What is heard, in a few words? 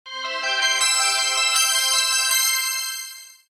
chord ringtone